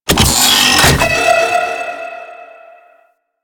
Jamming Drone Spawn; Warframe; Corpus; Corpus Railjack

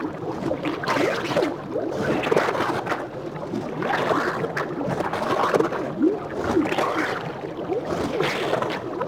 Infested Eris Interior Caves Vein Loop; Warframe

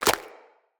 Agaric Mushroom Grow; Resource Materials

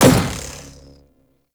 Shot Fire, Weapons; Warframe; Cedo Prime

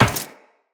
Run Metal Perforated, Footsteps; Tenno

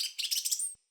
Bird Song; Josalfar Bird Guide

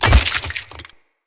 Root Spike Impale; Powers; Bromius

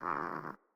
Kitten Snore; Enemies; Catbrow

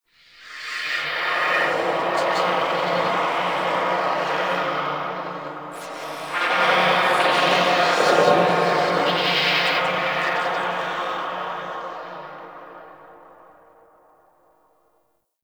Whisper; Warframe; Entrati; Dead End Secret; Dante Unbound

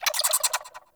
Bird Clicky; Eidolon Plains

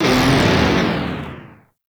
Proj Large Fire; Warframe; Lizzie

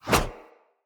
Battle Dog Paw Swipe; Snc Battle Dog; Combat

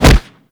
Fighting Blocked Impact, Weapons; Warframe

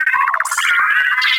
Advert Bot Chatter; Ambience; Props; Corpus Ship

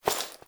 Body Move

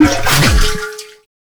Oil Grenade Throw; Vehicles; Abilities; Warframe; Nechro Mech

